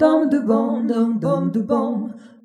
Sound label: Jazzy Harmony